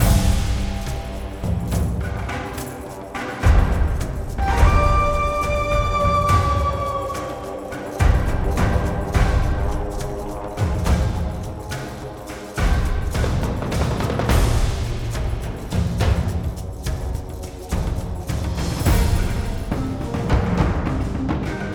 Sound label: Battle Night Loop